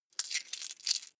coins clatter heavy

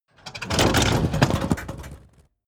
tank large damaged

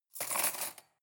cutlery cake forks drawer place

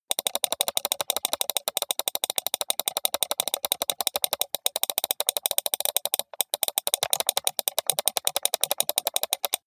computer mouse click sequence steady long

